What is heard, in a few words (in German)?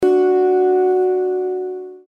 Zithermacher Ton B LAUTER